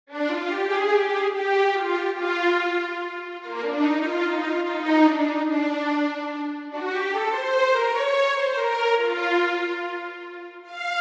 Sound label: perf legato sfz